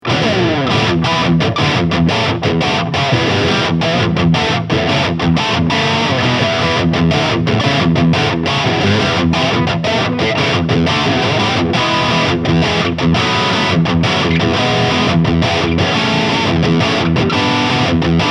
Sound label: RR X Series Crunch